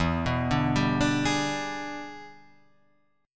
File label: E 7th Sharp 9th, chord